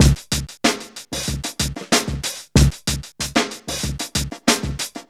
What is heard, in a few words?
FUNKY B 94, Funky Elements LOOPS; Zero-G